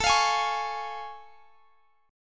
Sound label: A Augmented Major 7th A